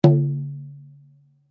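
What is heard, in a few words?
Drum Flat Skin forte, Drum heads isolated from drum shell; Drum Flat Skin B; Musical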